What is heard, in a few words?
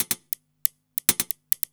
percussion loops, Techno; PS-45 WAV Techno ID